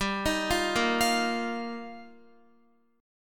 G Minor 7th Sharp 5th, Guitar; Gm7#5; arpeggiated

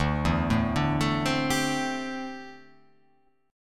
D 7th Suspended 2nd Suspended 4th D

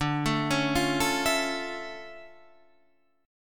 D Minor Major 9th D down; DmM9; arpeggiated; JGuitar

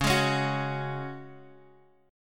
Db Suspended 4th Db; strummed

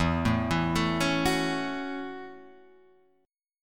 F sharp Minor 7th

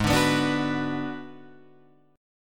Ab Minor 7th Flat 5th Ab, down, Guitar, Open G

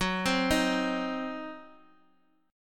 C Diminished Gb

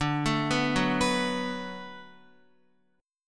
D 6th D down Guitar, chord; D6 arpeggiated; tuning 4E,4A,5D,5G,5C,6F

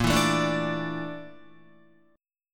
Bb Major 7th Flat 5th Bb down Guitar; BbM7b5 strummed; JGuitar

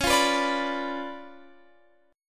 Db 7th Flat 9th, Guitar, Open F; Db7b9 strummed

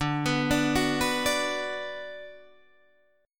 D 6th D down Guitar Open D, chord

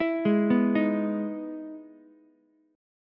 E Major 7th E down Ukulele; EM7 arpeggiated; JGuitar

